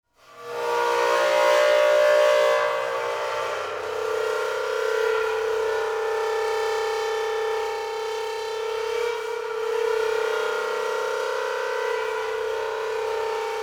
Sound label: beating multiphonic, bowed cymbals